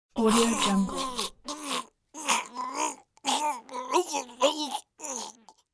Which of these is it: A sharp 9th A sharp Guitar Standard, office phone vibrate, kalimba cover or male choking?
male choking